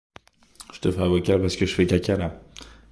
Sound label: vocal